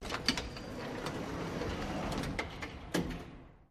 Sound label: Elevator Gates Doors